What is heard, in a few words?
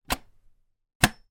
household small metal ventilation vent slide open closed